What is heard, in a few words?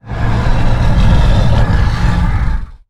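Stealth Attack Start; Evolve; Goliath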